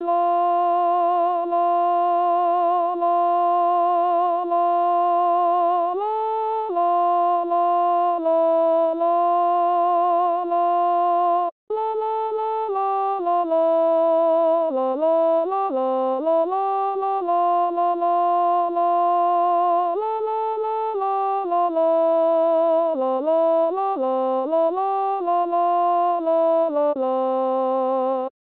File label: Kousk alto